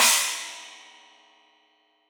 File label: China, Crash, China Cymbal